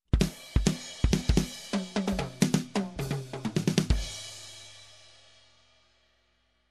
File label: Jazzy Drum Fill